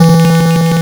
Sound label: E3, LSDJ Notes; LIMAT LSDJ kit